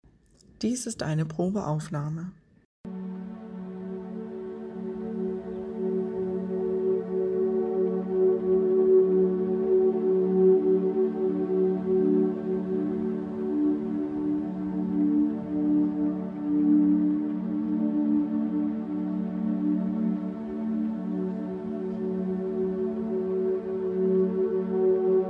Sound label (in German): 741 Solfeggio Hoerpprobe Luna Waves